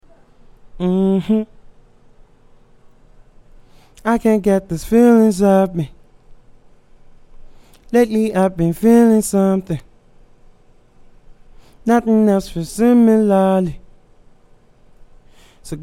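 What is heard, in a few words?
pop, 122, G major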